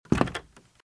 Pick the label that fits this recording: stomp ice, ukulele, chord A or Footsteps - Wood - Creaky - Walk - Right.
Footsteps - Wood - Creaky - Walk - Right